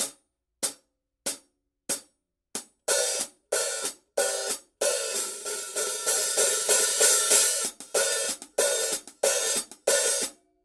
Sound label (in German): Paiste 13 PST 3 Hi Hat Becken